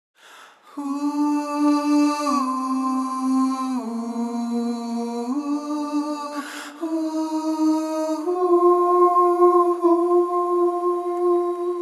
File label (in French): a capella